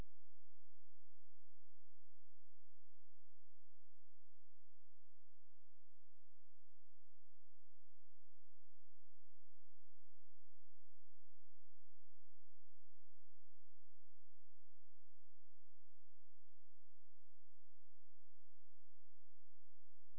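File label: hydrophone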